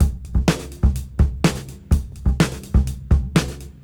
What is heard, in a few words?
Disk 125 BPM; AMG - Keith LeBlanc Phat Butt Jams